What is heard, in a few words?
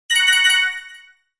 multimedia game star win gain x3; zapsplat